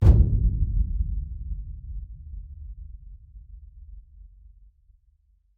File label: Boot; F1 2016